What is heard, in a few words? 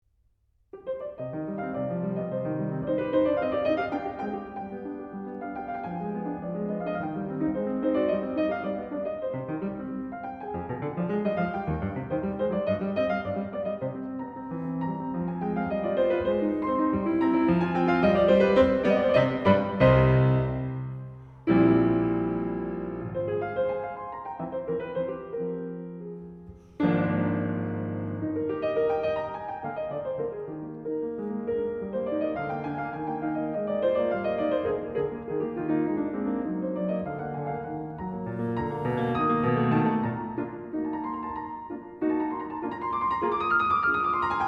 Piano Sonata No. 8 in C Minor